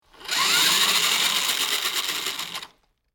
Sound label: Rc Car Radio Controll